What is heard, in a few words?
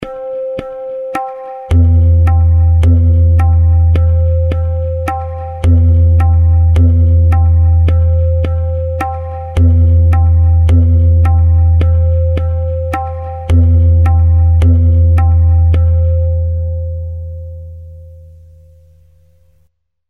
rupak, tala